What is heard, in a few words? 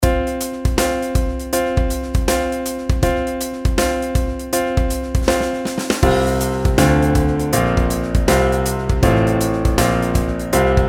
pitch patterns skipwise